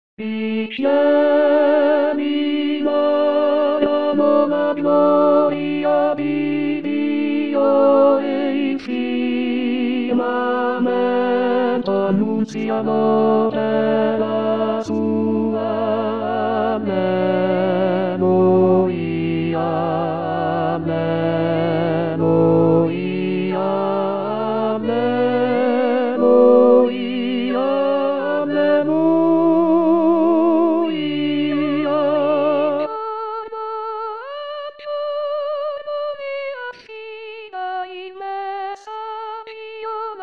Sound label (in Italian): tenori